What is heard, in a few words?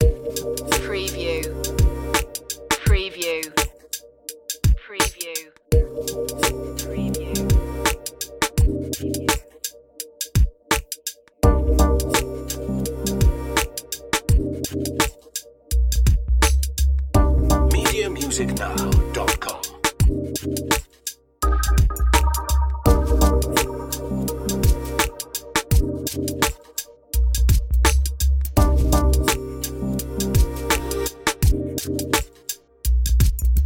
abstract vibes, chill